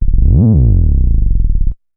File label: Synth oneshot Moog Slide Gm, Synth Bass; Synth Bass One Shots; Slate Digital BASSHOLE Sample Pack